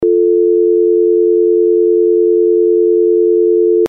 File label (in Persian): azadboog, بوق تلفن; انواع بوق تلفن و معنای آن